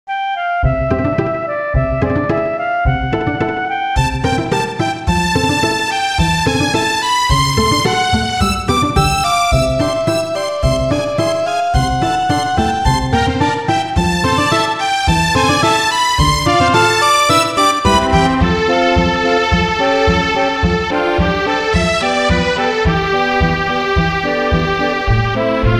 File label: 16bit reverb Loop